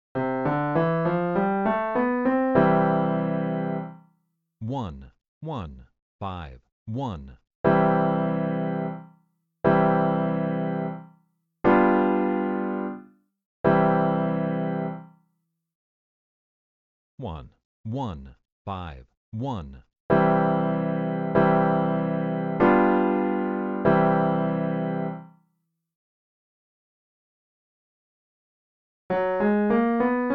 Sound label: I V7 Easy; Training Exercise